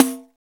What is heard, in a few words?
Pandiero, Roland R-8